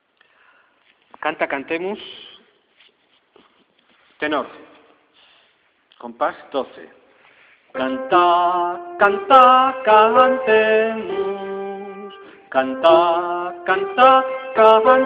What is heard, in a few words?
tenor